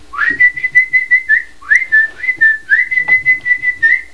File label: WHISTLE